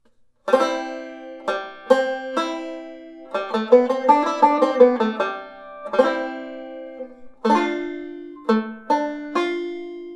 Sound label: 2nd Set, chord melody; Beyond Chord Melody Volume II